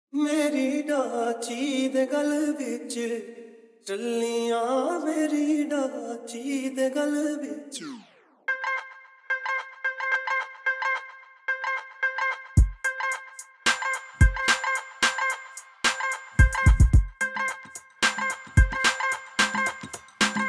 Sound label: Tumbi Bass